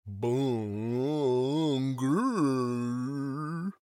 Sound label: stunned